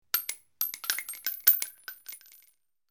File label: Multiple Shells Ejec; mvmt